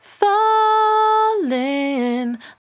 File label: 68bpm D, Velvet Vocal; Zero-G